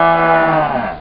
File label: Crash; tempest 4000